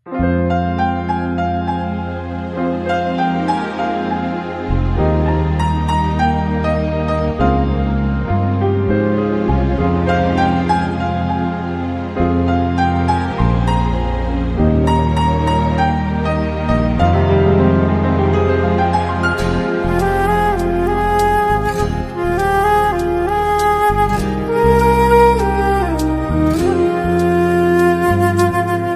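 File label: background Tone Telugu Ringtone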